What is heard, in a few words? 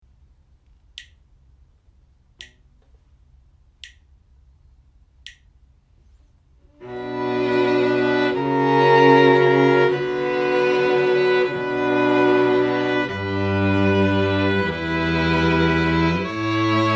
harmonic dictation